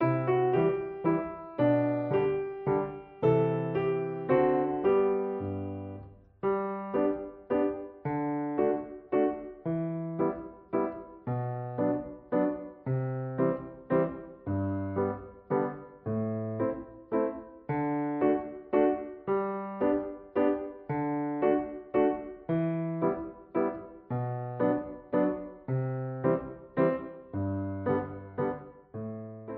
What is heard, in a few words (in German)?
menuett playalong